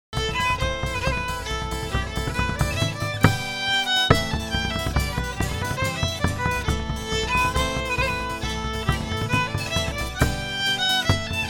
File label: POLKAS polka